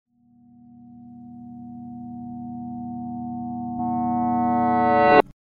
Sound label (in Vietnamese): hieu ung am thanh cang thang gia tang yeu thich